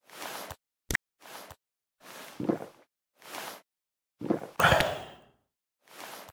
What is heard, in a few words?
drink flask; Stalker Anomaly; interface item usage